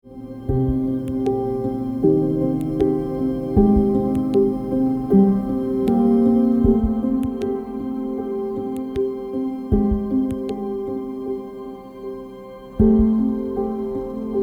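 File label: Music Toolkit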